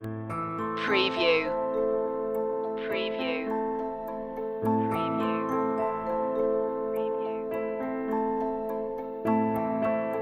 pno woods synth bed

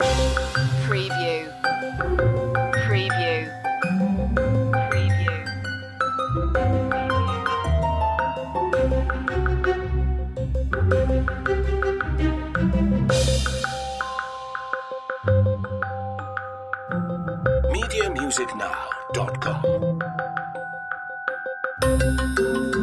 quirky creatures

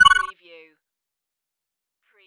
digipanel

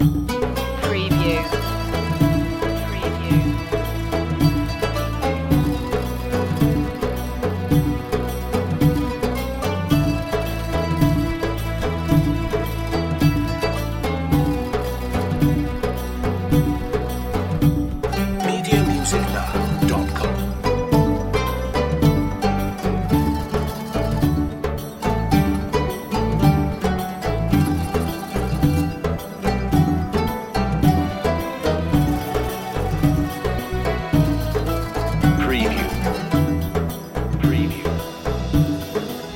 bedouin celebration loop

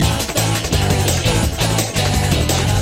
surf loop

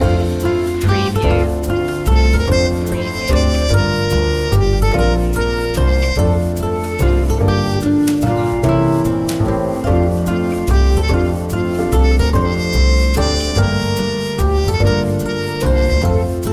away in a manger jazz

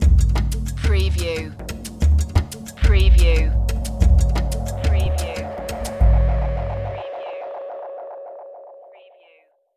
jungle stinger

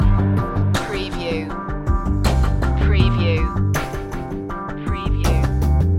quietly confident loop

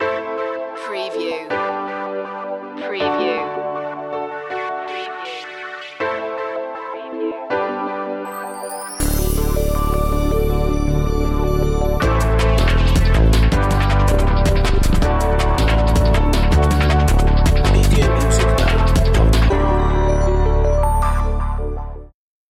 techno up promo

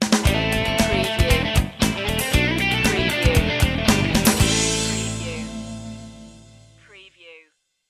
funk rock sting

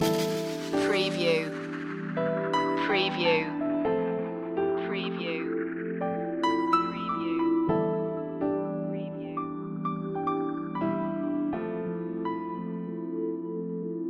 waterfall loop

